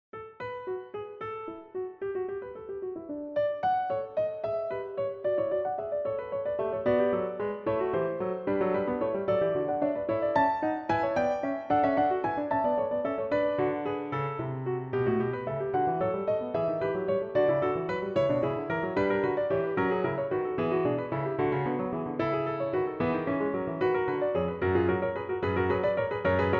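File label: Fugue D major, Steinway; Albrechtsberger; Ivory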